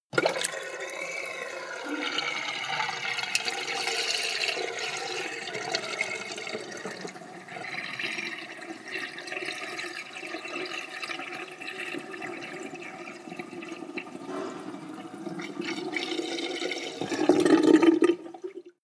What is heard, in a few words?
sink drain long; haus garten; badezimmer